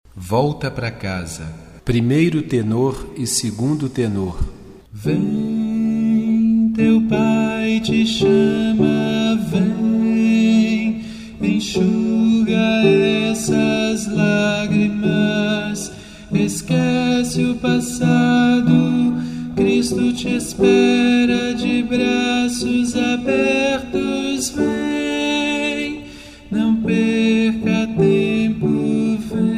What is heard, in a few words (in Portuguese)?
1. tenor e 2. tenor; coral vozes da esperança; kit de vozes